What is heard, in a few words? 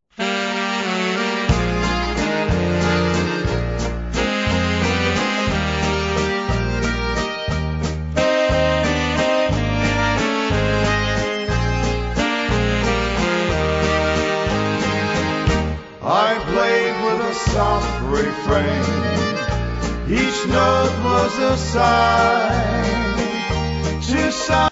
harmonika waltz